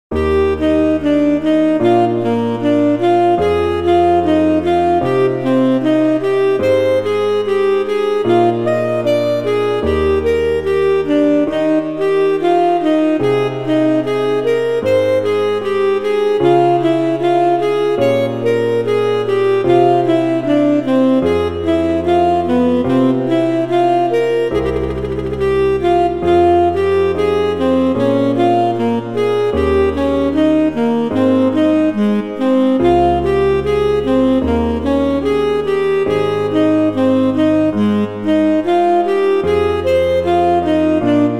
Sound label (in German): Andante Presto schnell mit Sax; Playbacks; Bach Johann Sebastian; Sonate 4 BWV1033